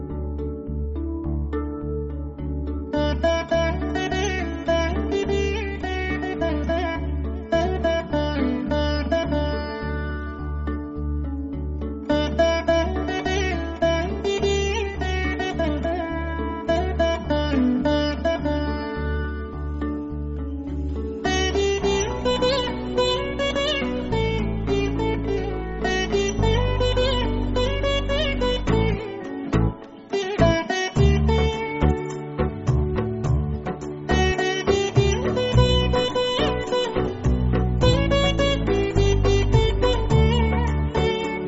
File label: instrumental